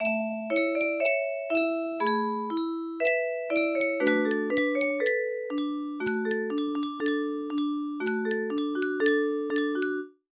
Music Box

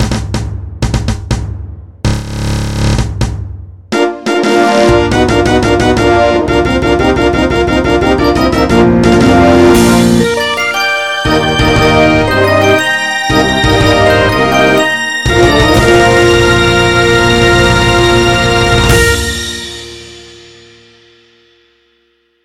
fanfare; 20th Century Fox fanfare my version

